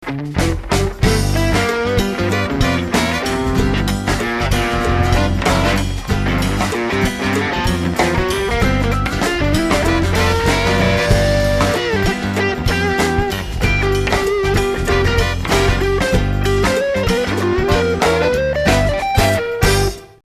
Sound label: 2nd line solo